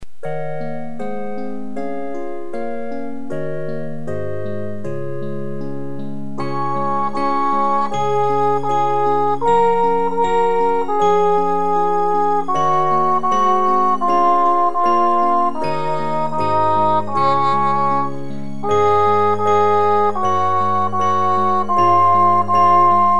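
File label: Twinkle Twinkle Eb (Db concert)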